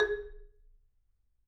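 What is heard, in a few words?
G#, Marimba dead note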